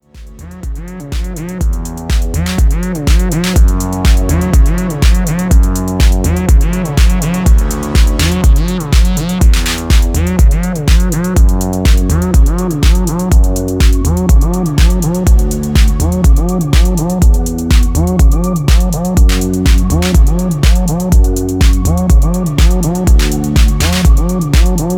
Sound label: Boomclap Acid